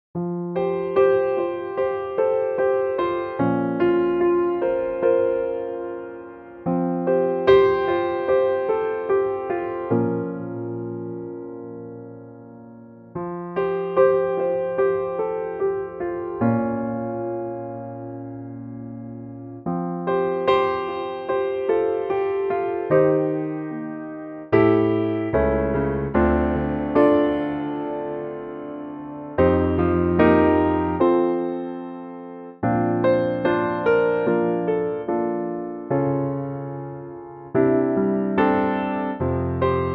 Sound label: piano; Coco; key - F - vocal range - A to G; Disney Pixar movie Coco; piano only arrangement